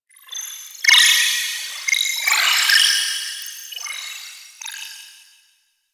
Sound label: Droid Array One Off; Warframe; Cephalon